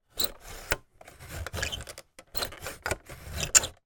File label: toy magnetic drawing board eraser slider movements